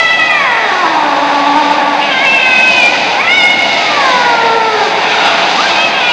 kitty in ravine; pets; Sound Effects for Multimedia - Volume 1

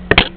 Mace; Arena Games 3.0

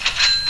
New Level; Lawyers 1.0

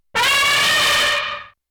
elephant; PC Play and Learn

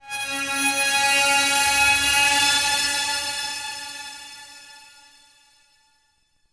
ice; DAoC

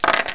dice

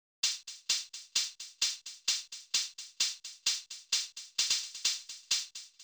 breaky bpm130, drumloops

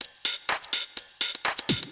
PERCLOOP HOUSE 125; eJay

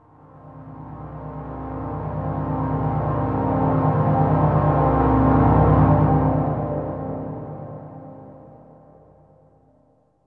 synth pad ambient 160 C; Sphere

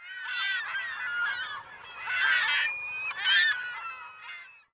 GULLS, water; zones

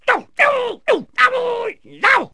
karate; Wired for Sound Pro CD; Aristosoft, Inc.; sports

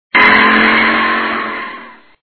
burn Out

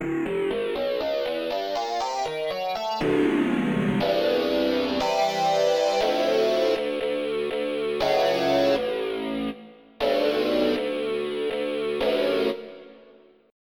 Dry Synth Guitar

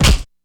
block

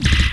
fan fire

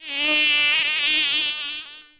insect; DAoC

